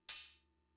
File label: Cymbal, Fricke MFB-522, Drum Machines